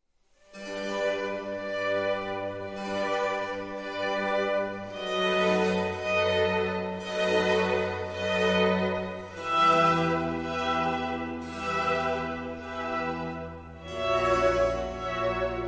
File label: Concerto for violin in C major; No 7; Antonio Vivaldi